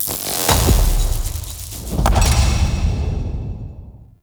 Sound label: Cephalon Puzzle Action; Warframe; Infested Eris; Cephalon Crypt Puzzle